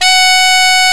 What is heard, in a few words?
F#4, REED; AKAI S-Series CD-ROM Sound Library VOL-8